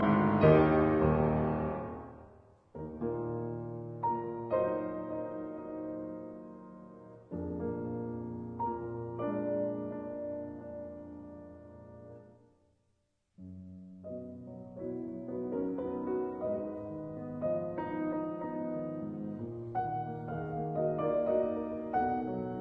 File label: Piano Sonata in G sharp minor, Sonata Fantasy Andante; Op 19